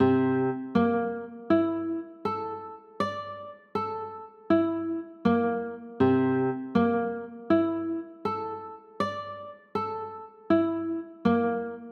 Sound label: c 6, chords